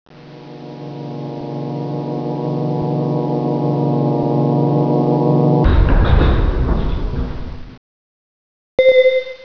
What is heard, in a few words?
elevator rise; sectore